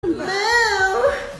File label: sussy moo short